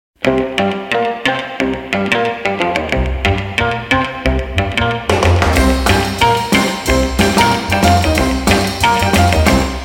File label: Rockabilly